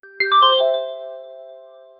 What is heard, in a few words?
question appears message, trivia